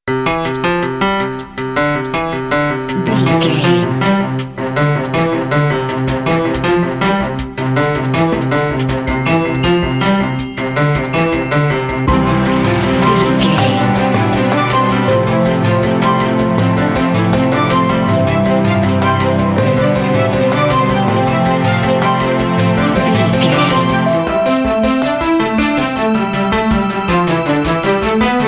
perpetual symetric motion bumper

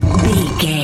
rock door slide block move drag

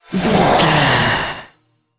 magic shine light spell

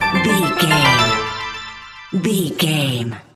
point sticker bonus score reward jingle

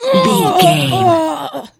voice female death

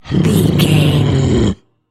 troll monster growl

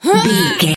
voice female effort push fast

